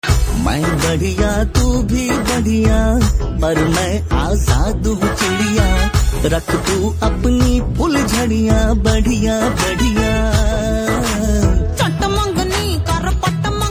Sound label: Male Version; Sanju